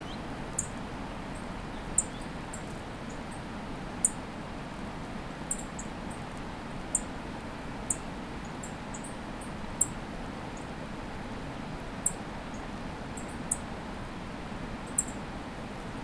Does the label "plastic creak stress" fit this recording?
No